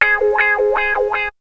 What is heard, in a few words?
HI WAH GTR; BEAT2000